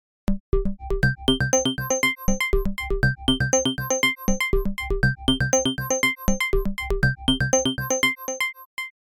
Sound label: RINGTONE FM